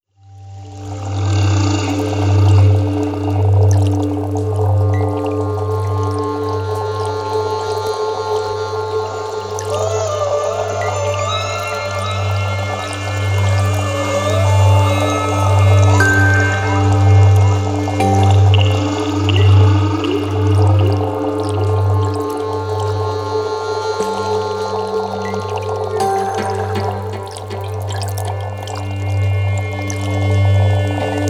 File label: ETHNOSTEP; SUBBASS NETLABEL